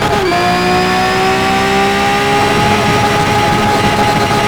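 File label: fourth cruise, car engine; sgmcars; veneno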